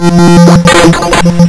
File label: lsdj waves; wavetables